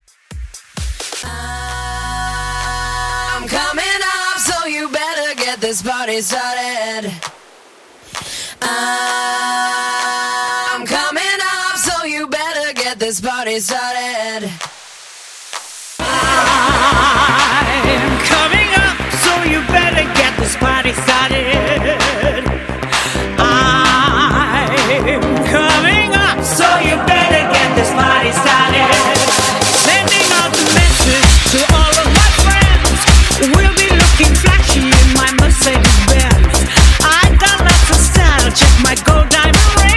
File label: Acapella Intro 90s Dance Club 130 BPM